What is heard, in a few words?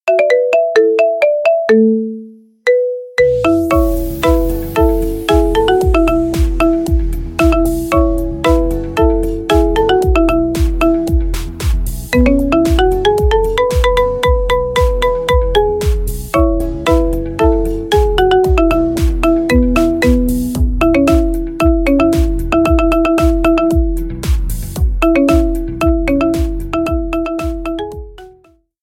marimba remix